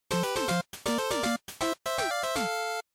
GB Action Clear

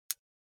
Smartphone Lock Unlock, Dry

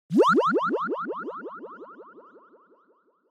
Motion Pop

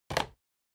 Calendar (Put Down)

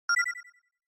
Short Accent Delay

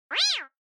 Cat Synthetic

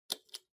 Stopwatch Mechanical Reset Table

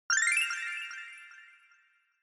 Scene Change Low

